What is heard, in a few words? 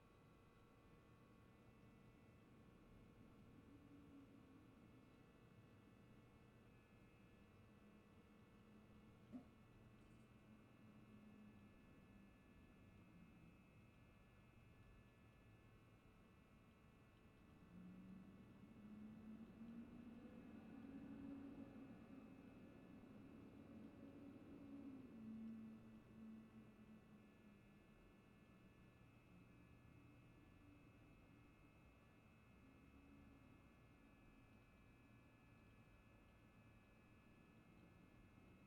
Office